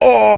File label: aw; ooh ahh